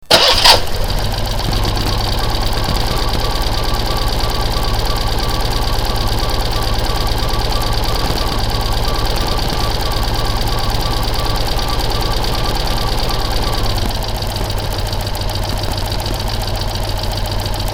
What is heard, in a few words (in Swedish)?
start med lite choke